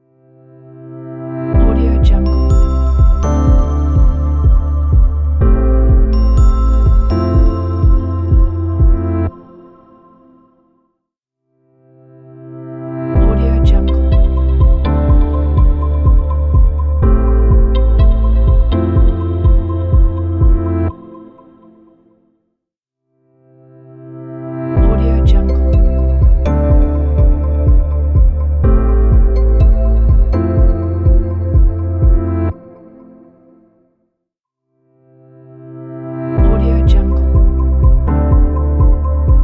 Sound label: corporate logo